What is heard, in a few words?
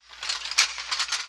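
Armor Movement Clanks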